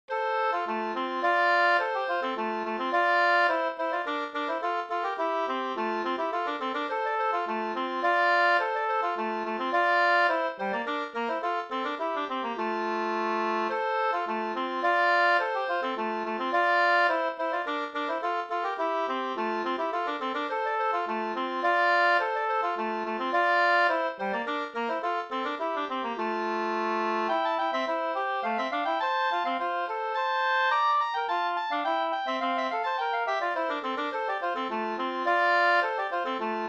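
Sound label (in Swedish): slangpolska, two-part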